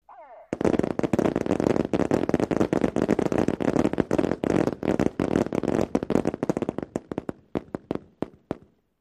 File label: M-16: Weapons 12 Simultaneous Firing. Sergeant Yells 'fire' At Head